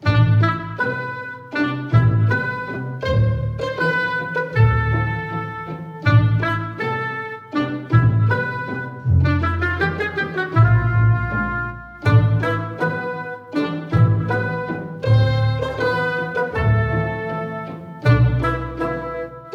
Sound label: Village BPM80 Am; 4/4; Woodwinds + Strings; Village 5